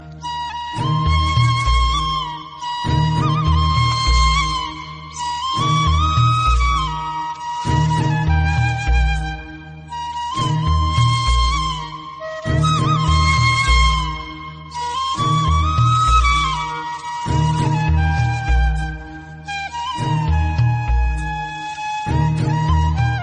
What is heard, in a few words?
flute background instrumental tone